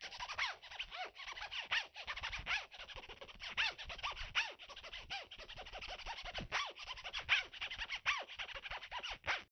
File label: scratch